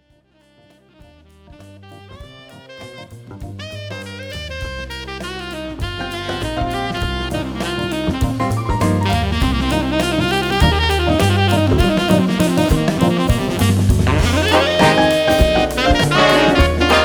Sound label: Minor Drift